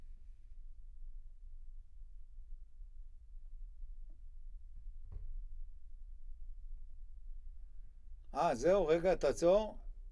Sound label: C Bass Bot